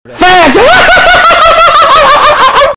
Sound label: laugh